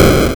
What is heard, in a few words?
explosion; 2d game unity